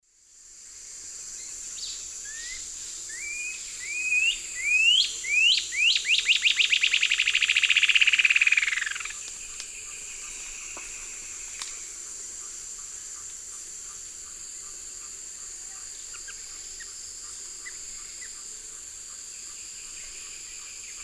Thamnomanes caesius, song, S. America Eastern Lowlands